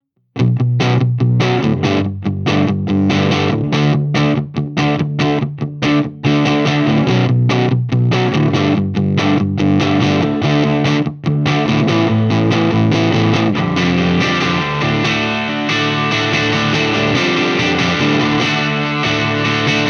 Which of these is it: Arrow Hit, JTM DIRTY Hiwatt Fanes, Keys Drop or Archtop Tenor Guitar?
JTM DIRTY Hiwatt Fanes